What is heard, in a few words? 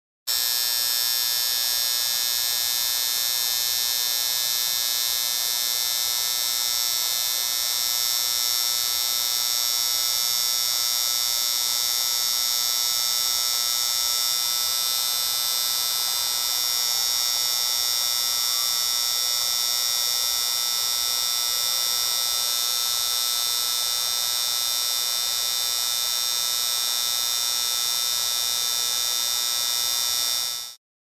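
neon lamp humming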